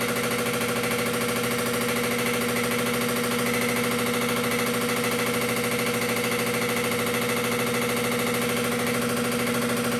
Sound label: damper assembly; minha lavadora samsung esta fazendo barulho; home-appliances